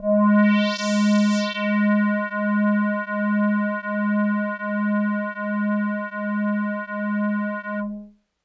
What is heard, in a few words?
Hologram Kawaii Gs2; keys